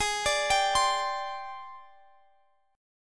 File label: Ab Major 7th Flat 5th Ab down, guitar chordshape; arpeggiated